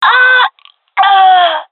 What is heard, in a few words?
death, gendarmerie fem; vo; agents